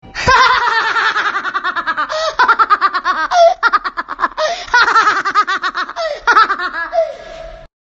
狂笑（小学生）

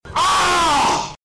人物 大叫

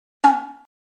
咯噔一下

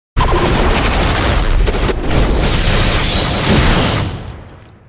打斗-大混战

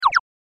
搞怪

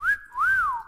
吹口哨

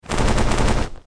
打斗 快速手势（结印）

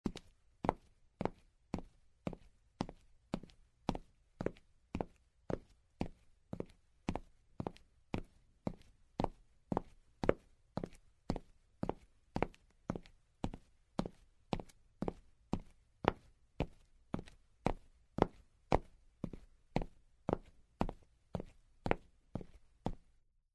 走路-皮鞋

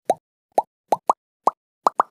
啵，气泡音效

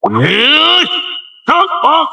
猖狂

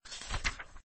翻书声

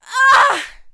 女-受伤

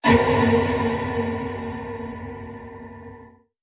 砰！恐怖惊吓声

